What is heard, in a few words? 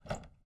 door latch; angband